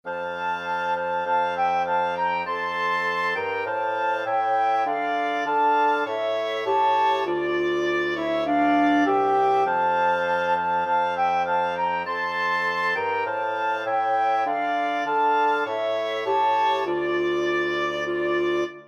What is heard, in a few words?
ukraine national, wind quartet